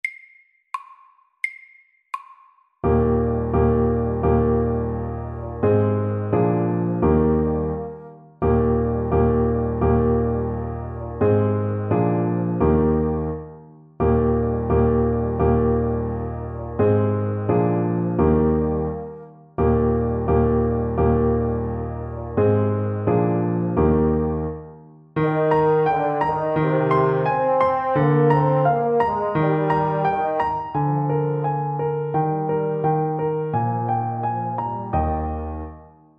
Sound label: Ecossaise, 86